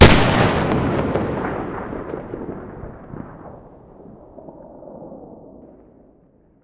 awm muzzle stereo, weapons

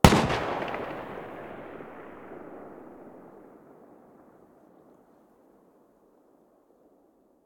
galil muzzle mono far

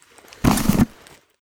mask use; STALKER; IWP; item usage

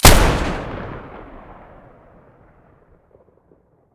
m590 muzzle stereo; mossberg

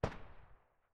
ump45 silencer mono far

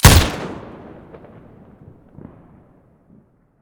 wa2000 muzzle stereo

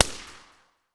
silencer p99 mono far, weapons

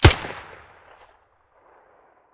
silencer fiveseven mono, weapons

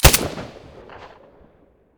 silencer glock17 stereo

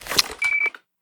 veles quickdraw, detectors; STALKER; IWP

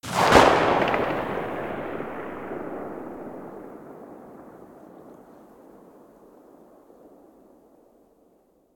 mosin muzzle mono far, weapons

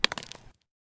grenade dirt; STALKER; IWP

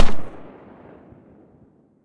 galil silencer mono far

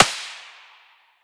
svu muzzle mono far, weapons

